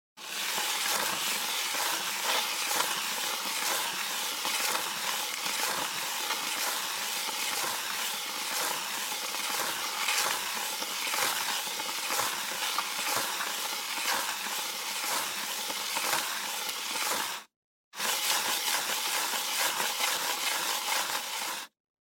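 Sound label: sound effect of dragging plastic box on smooth hard cement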